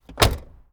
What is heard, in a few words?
Car Door Shut Plymouth acclaim Bulky